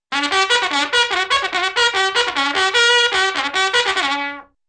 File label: sour bugle reveille